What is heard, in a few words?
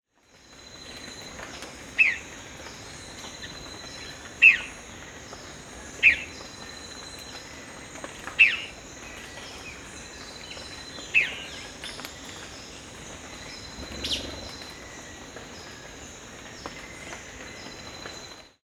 Thamnomanes; پرنده بوتهخواهها